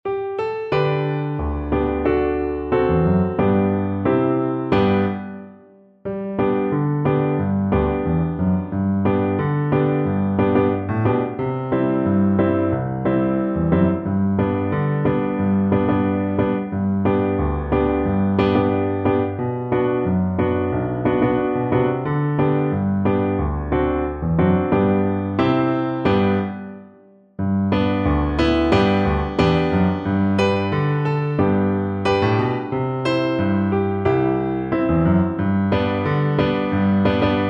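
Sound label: recorder karaoke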